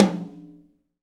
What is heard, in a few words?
TOM, Roland, Real Kit